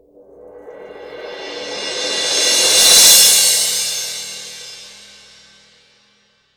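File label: mallet cymbal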